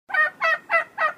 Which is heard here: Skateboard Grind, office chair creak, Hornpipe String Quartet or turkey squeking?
turkey squeking